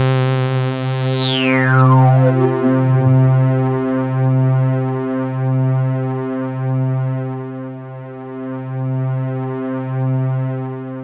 C3, Synth Line

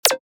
cool snare

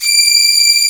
STR2 SEC D6, Strings; STR SECT #2

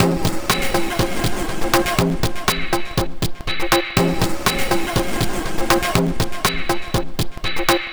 Nativez 121, Lounge Drumz

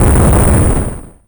WN FM BANG, ANALOG PERC; Zero-G Ambient 1

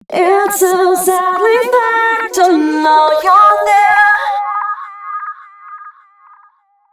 female c# 138, hooklines 136-140 BPM; Mutekki Media; Dance Ultra Voice VOL-1

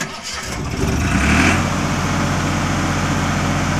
DIESEL ENG, Diesel Motor; SFX

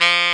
SAX XSAX, Sax Sect.; Saxophones

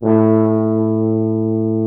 Orchestral Brass

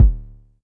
Polite kicks long D1; LIMAT Div Dept; Drums

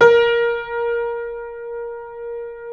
A#3 R, Keyboards Acoustic; USB Soundscan; AKAI

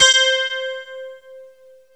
clavet C5

